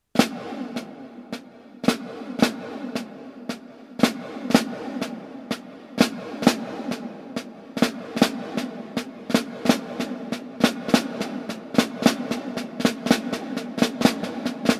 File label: pataflafla